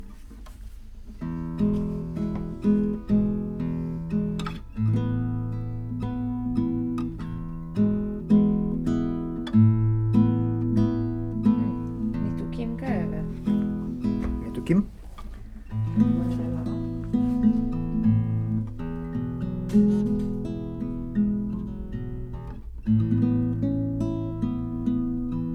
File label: Tape GTR